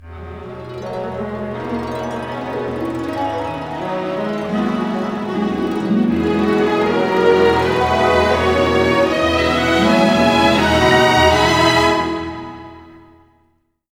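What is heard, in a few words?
80, orchestra; East West; Scoring Tools; Let's Fly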